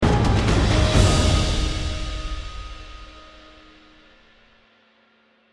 Combat Stinger

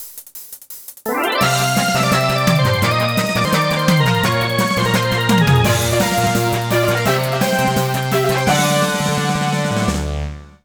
All Stage Clear

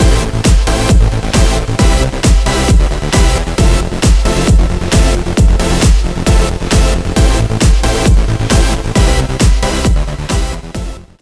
Stinger; DFH Stadium

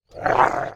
Wolf alerted; ice age scrats nutty adventure

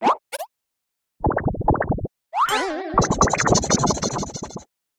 Field Mat Tap; Yu-Gi-Oh! Master Duel

